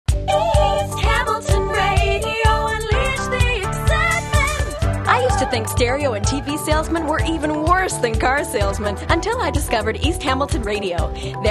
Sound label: Radio dance; East Hamilton; jingles